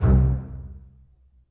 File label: Double Bass Section Staccato; Ableton; Orchestral Strings